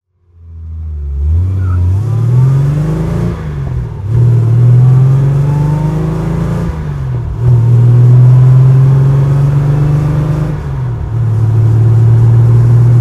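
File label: kougar jaguar 42L driving, Engine sound driving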